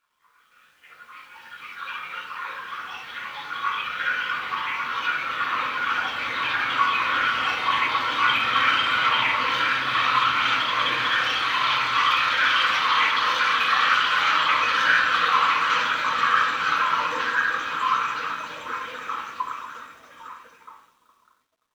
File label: Locks Trickle